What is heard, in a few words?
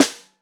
Snare Bottom